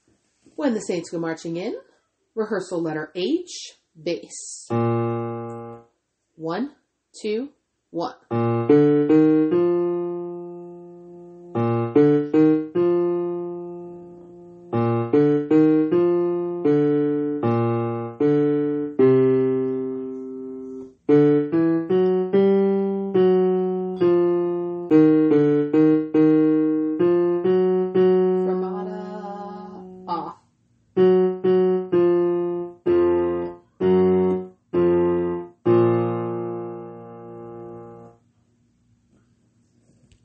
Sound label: bass; when the saints